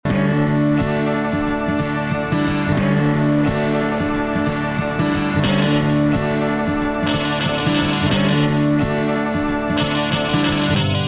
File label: guitar